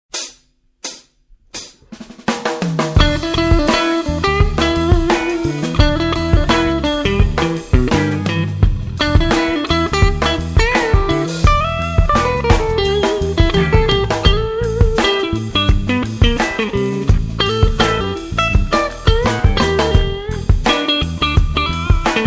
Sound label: blues jazz